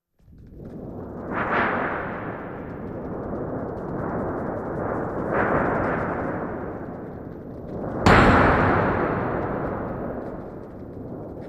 thunder sheet; "mighty hits"

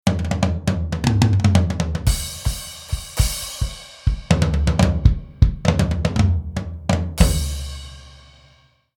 Tom Roll; Realsamples

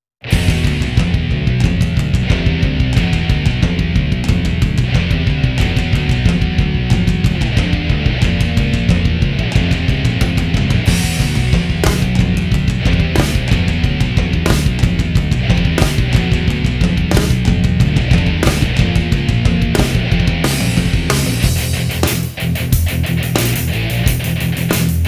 Heavy Metal; eq and compressor; Realsamples

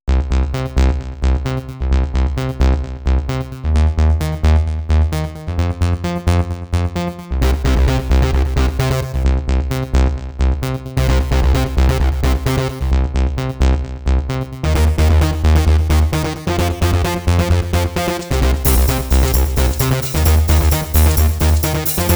Sequencer; strumenti

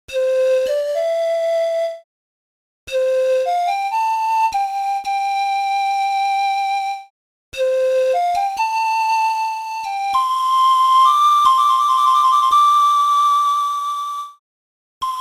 Thai Flute; Un flauto thailandese; Un flauto thailandese regalatomi da un mio amico

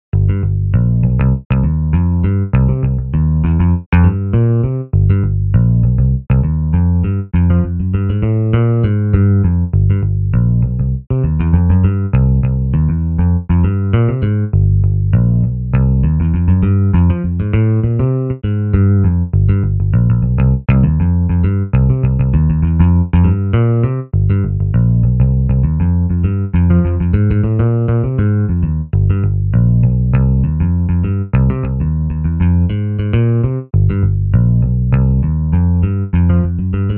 Jazzy Bass; Realsamples

